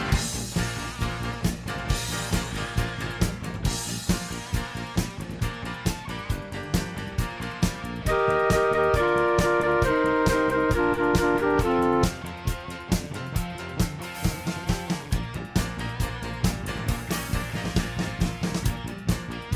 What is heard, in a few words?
accompaniment